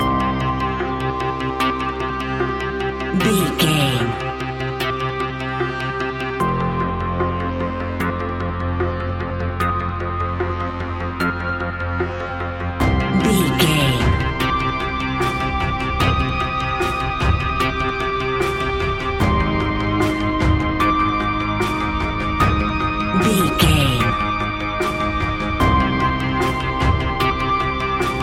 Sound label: big atmospheric tension